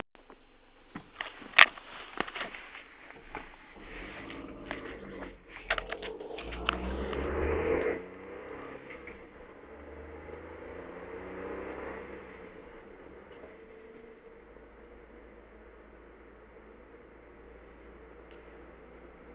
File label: melody